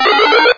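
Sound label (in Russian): коробочка мелодия перезвон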